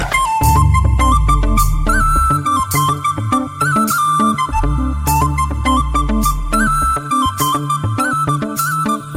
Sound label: Flute